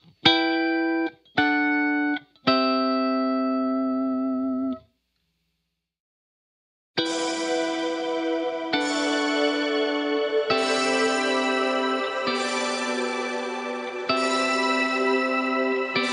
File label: 80s Fusion